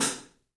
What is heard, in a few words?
3D Drums, TIGHT ZILDJ; 3D Sound